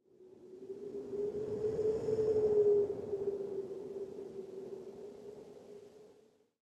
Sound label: Wind